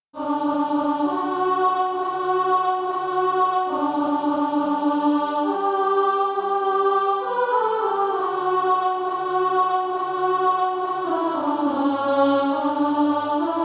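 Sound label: alto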